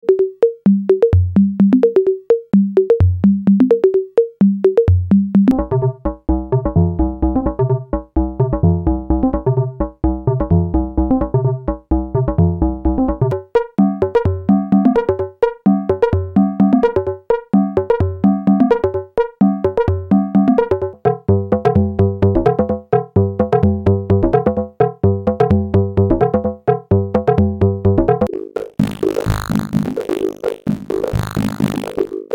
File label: drum machine loop